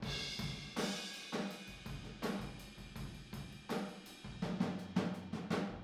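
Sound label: Boogaloo Fill Room stereo, 82 BPM; Sonic Foundry; Drums from the Big Room The Mixes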